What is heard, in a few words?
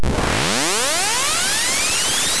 power